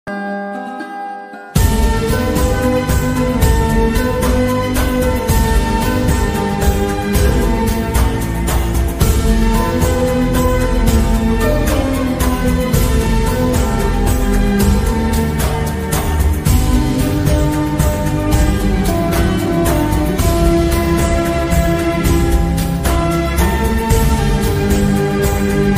flute ringtone slowly; miss you

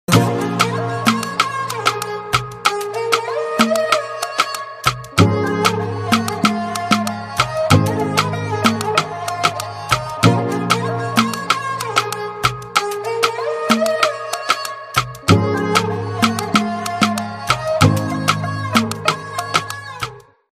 beat ringtone

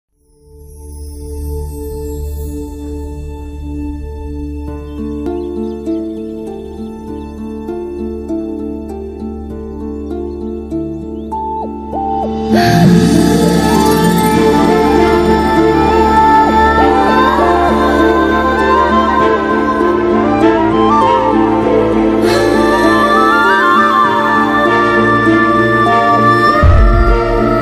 climax emotional bgm, mass bgm, sad bgm; yevadu

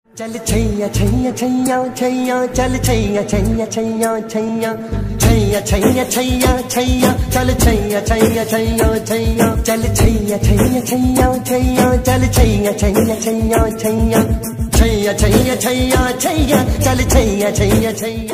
ringtone, 90s old song

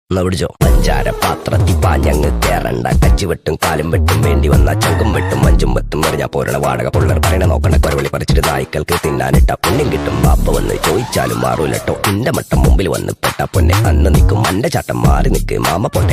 malayalam song bgm

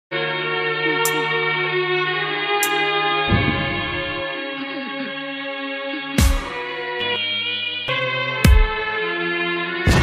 movie ringtones so sad ringtone sad ringtone; jaanwar; akshay kumar

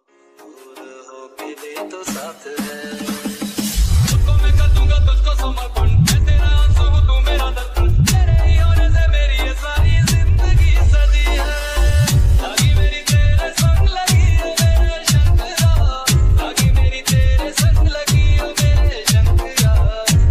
Bhole Baba ringtone, Shiv ringtone

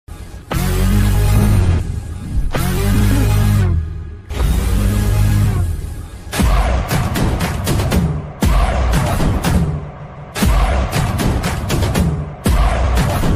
trailer bgm; rrr; ram charan

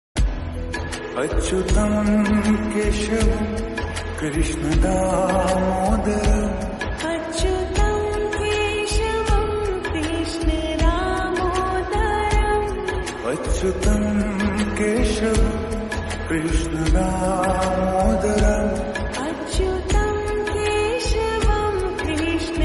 krishna music, bhakti ringtone

